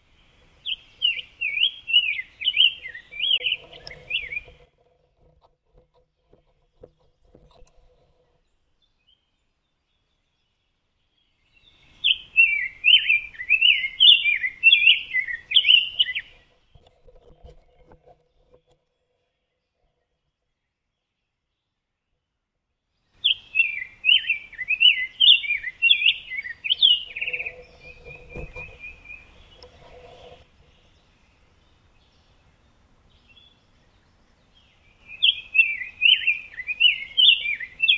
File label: Grosbeak